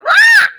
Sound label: dog scream